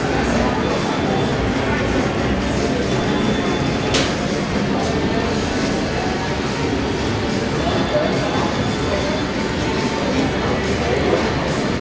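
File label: market ambience; best service Blue Box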